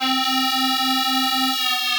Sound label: Pitch Witch, soaring leads; Ableton; Mood Reel